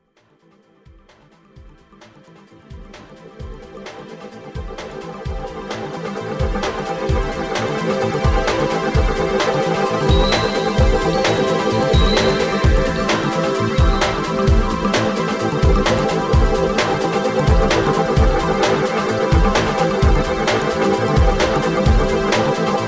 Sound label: ambiland